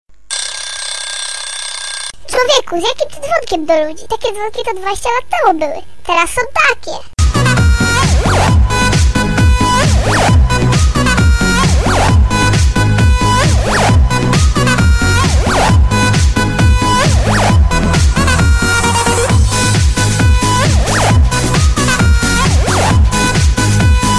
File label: Polish Ringtone